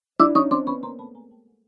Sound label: App Delay, ping; multimedia